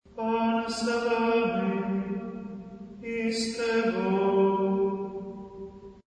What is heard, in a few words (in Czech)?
pozdrav pan choral